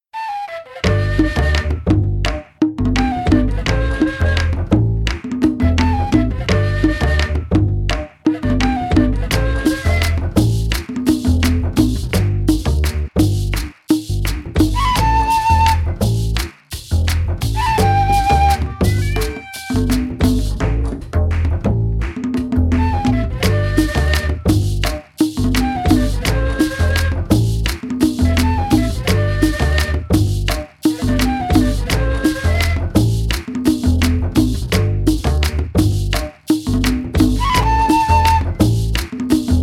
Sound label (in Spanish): cumbia instrumentos